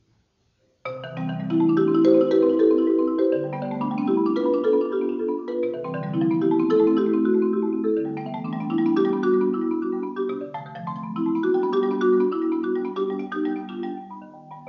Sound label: etude in C